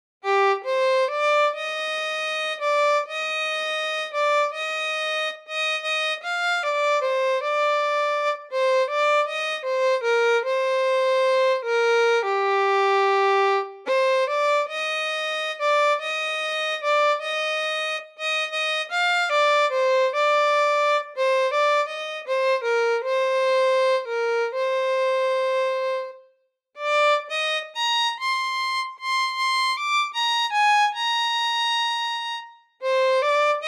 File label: violin; SWAM violin; learning practice